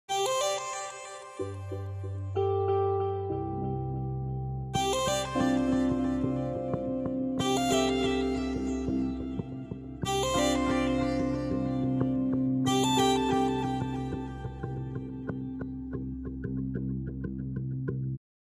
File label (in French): Pluie